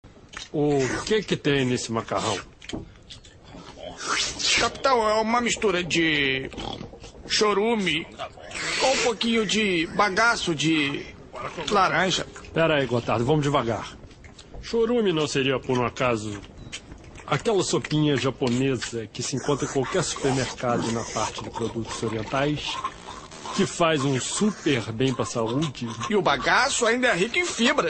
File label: maracca